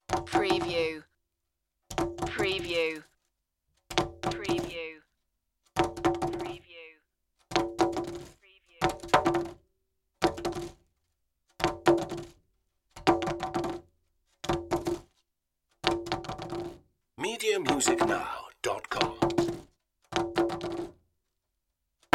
polystyrene drop

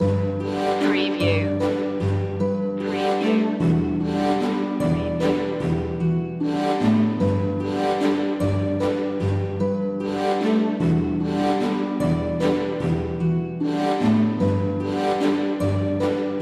regal waltz alt mix

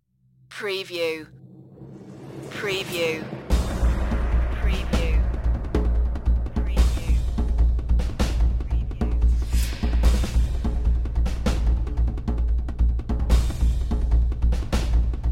close encounter percussion only version

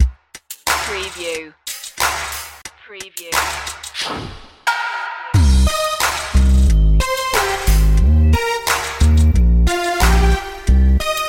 trap it

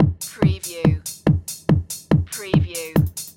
Techno-Trance Drum Loop 142 BPM; lynne-publishing

